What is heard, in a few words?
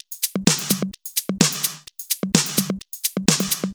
Houseloops 128BPM; Vengeance Samples